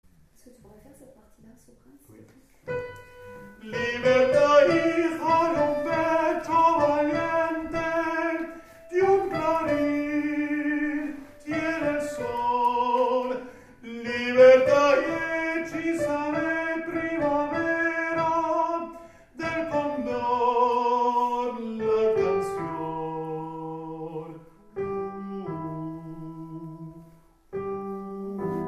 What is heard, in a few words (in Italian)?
Soprani